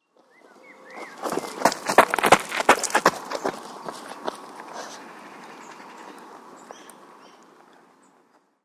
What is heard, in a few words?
Running On Gravel Path; Outside Ambience